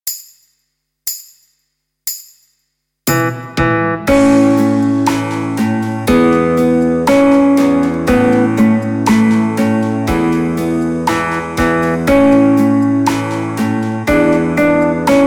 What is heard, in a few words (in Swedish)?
3 gitarrer